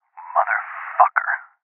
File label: Sniper Miss